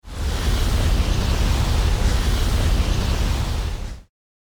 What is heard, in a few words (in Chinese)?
吸收, magic